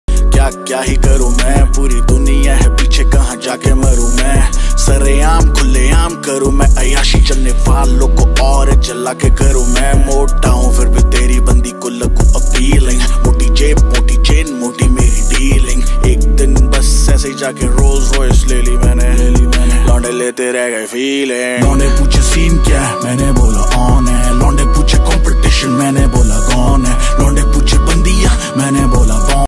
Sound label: hindi rap ringtone